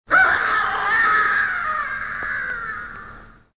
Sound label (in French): homme cri